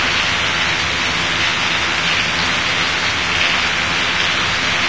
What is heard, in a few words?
conduit rain; ambient; ambience